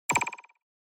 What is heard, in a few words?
Onoma Surprise High Short